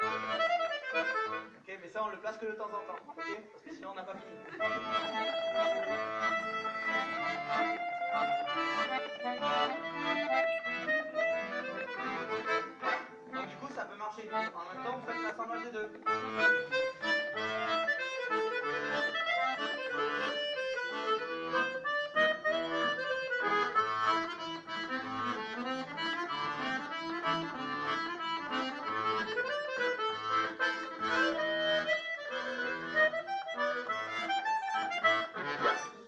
impro diatos

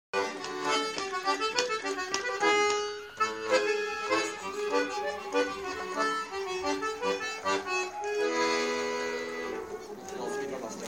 passage en sol dièse diatos; Monster Cafe

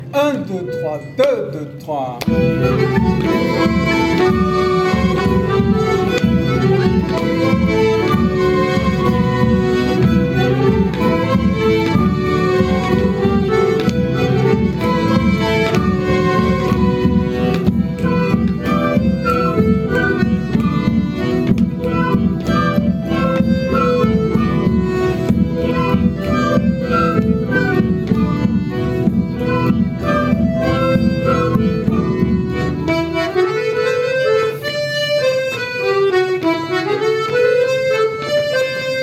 filage valse plan canon; Valse 4ème R

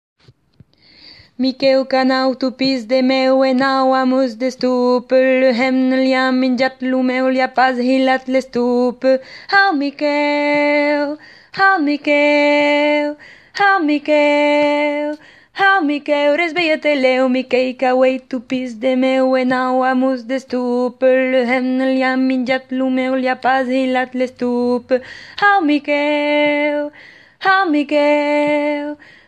voix basse; Ateliers chant

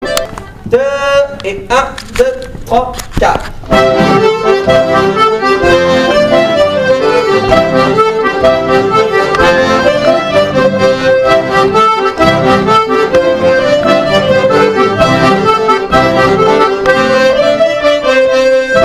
filage polka; Finish set; Musique d-ensemble

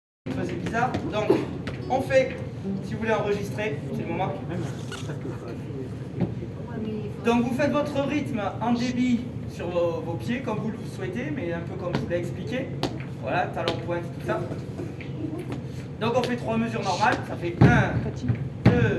Décomposition podorythmie; Belaye; Musique d-ensemble

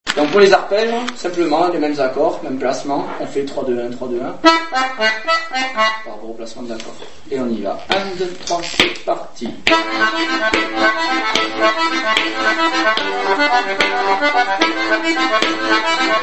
Fandango Arpeges

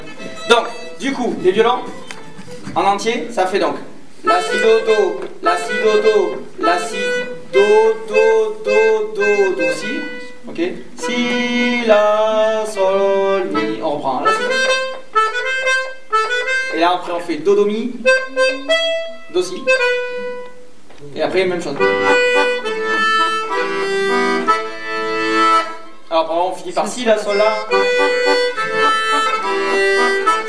2eme voix violons lent; 4eme partie; Musique d-ensemble

